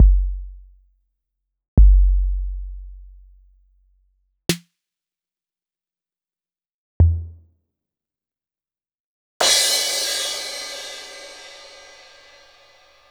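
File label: Roland MV8800 drum HFI KIT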